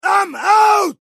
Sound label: reloading; csgo